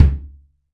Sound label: DW Jazz Kick, drums; PX7; Digital Sound Factory Protean Drums